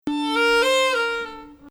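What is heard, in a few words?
Bayati Samai Husseini Nahawand, Arabic music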